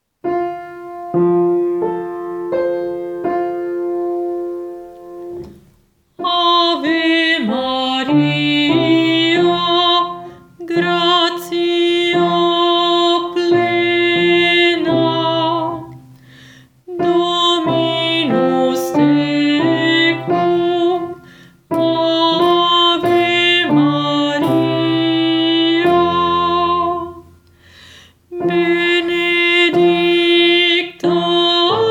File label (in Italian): Ave Maria contralti; Ave Maria Arcadelt